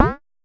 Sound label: Pollard Perc, Syndrum